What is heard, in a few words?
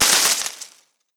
Rustling Leaves